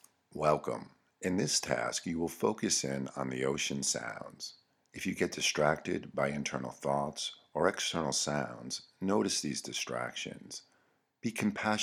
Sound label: ocean